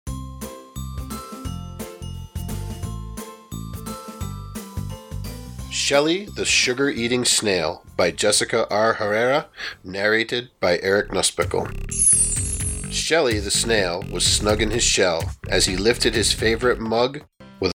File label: Music and Page Turn Cues